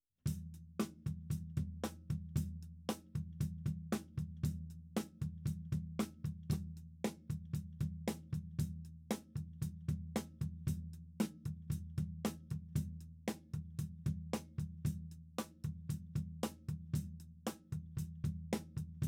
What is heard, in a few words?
Toms